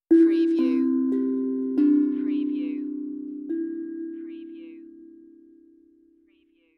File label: Tongue Drum Meditative Tone; beanstalk audio